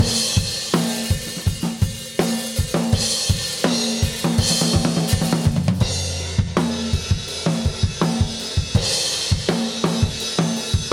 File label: sm58 x4 drum